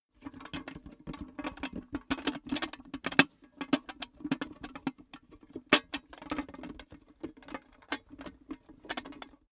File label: cash box metal handle; maschinen; soundpool